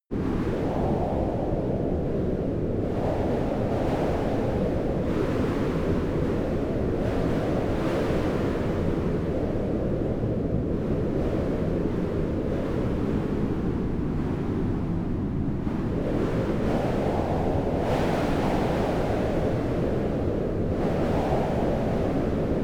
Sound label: wind cold exterior